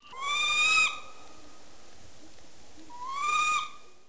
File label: saw whet owl alarm call; Male Saw Whet Owl